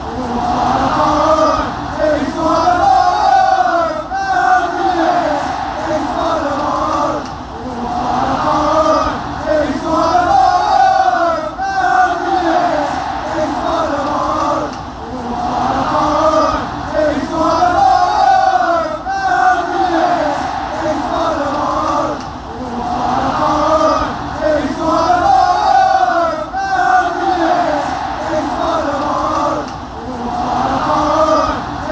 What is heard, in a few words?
fanchants